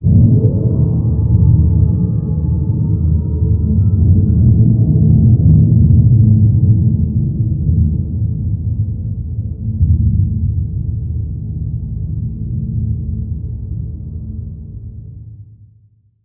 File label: Pylon idle; Barotraumatic